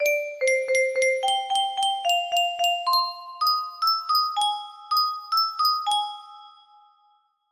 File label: chorus, music box melody